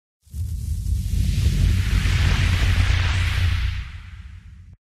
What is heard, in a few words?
Thunder element